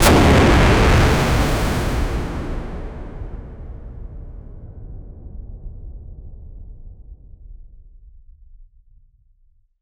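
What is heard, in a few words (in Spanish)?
explosion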